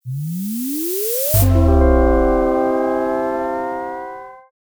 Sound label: second wind